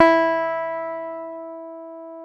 E 4 JAZZ GTR; Univers Sons; Jazzistic

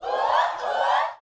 SHOUTS, Gospel

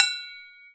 PAN, Brazil Drum Perc; E-MU Producer Series Vol. 7 – Old World Instruments

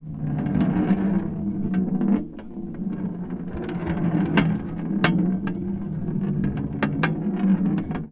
Tin Tray, Marbles, contact mic

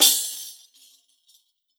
tamb, percussion; Best Service ProSamples vol.52 - World Instruments 2; MIXED PERCUSSION

